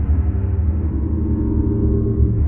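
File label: Ambience Sci-fi Energy Loop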